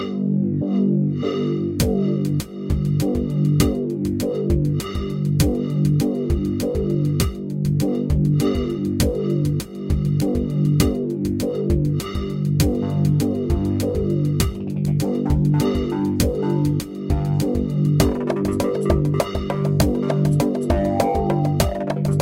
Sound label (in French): Instrumental